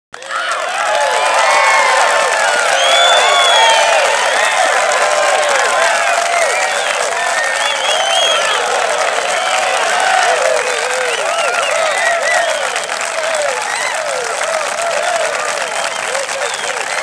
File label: cheer; GTFore